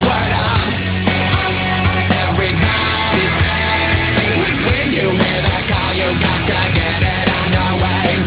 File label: bbox